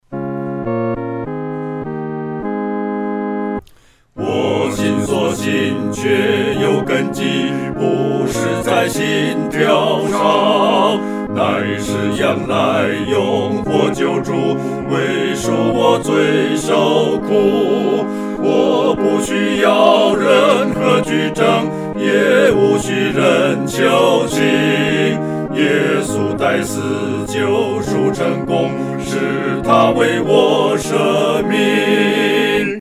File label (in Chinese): 合唱 四声部